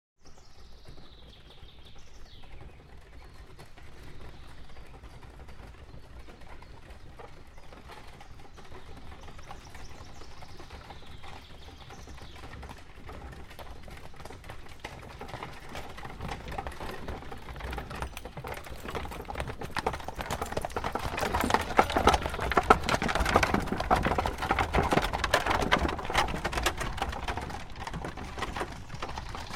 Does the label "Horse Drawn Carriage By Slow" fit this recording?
Yes